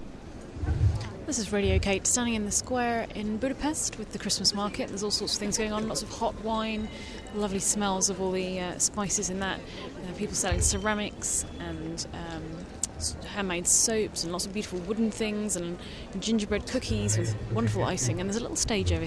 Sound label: beatboxing and hand bells